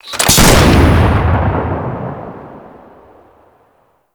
Missile Platform Launch; Warframe; Grineer

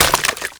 Run Sludge, Footsteps; Warframe; Tenno

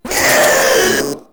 Proto Infested Runner Pain; Warframe

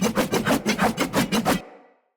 Sword Throw Loop; Weapons; Player; Swords; Garren Sword

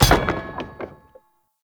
Debris Metal Stone; Warframe; Entrati; Ambience

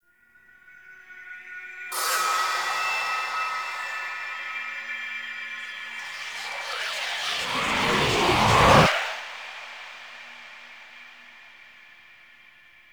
Void Echo Destroy; Warframe; The War Within; Mountain Pass Return Peak; Cinematics

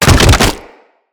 Infested Runner Bodyfall; Runners; Foley

